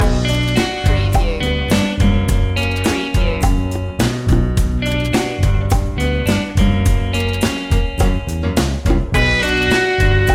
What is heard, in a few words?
latin heart loop